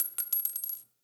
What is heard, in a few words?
Shell Casing; Target Practice; Warframe; Nineteen Ninety Nine; Quests; Quincy